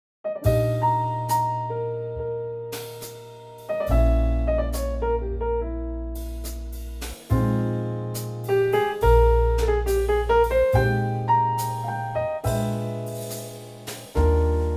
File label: ballad Eb trio